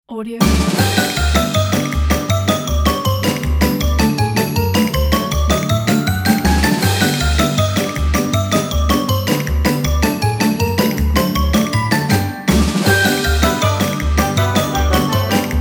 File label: circus music